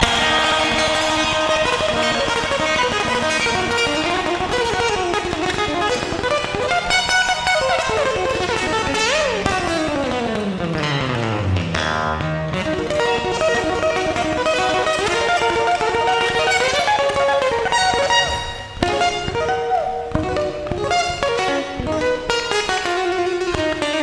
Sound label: acoustic guitar